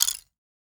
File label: Crafting UI Tab Button; User Interface